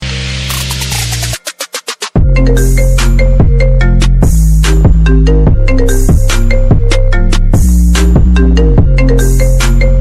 iphone ringtone trap remix; iphone ringtones

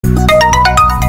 vivo v9 plus notification

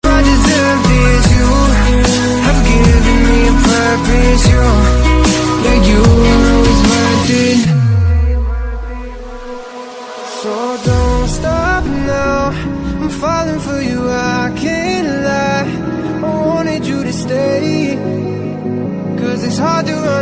music english ringtone; english ringtones

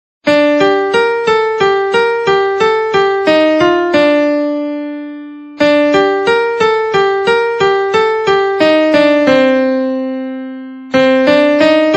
piano ringtone

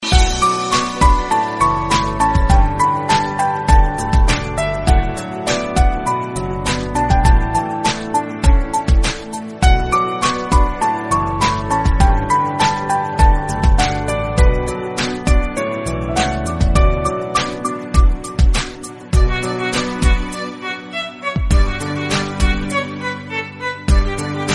romantic remix tone ringtone, Bollywood

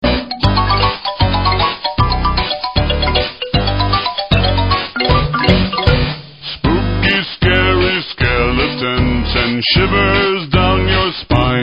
funny skeleton song tone ringtone; nokia

